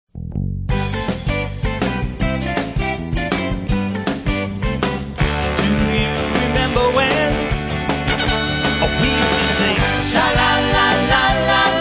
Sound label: Classic Rock